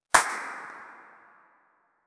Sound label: echoed clap musical one s